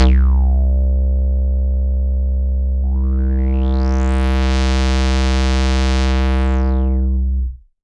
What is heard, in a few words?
C2, SynthLine; 90 s Sample CDs; NiceBeats; SynthLine VOL-15